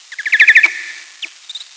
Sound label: nathusius social, bats echo; britishbats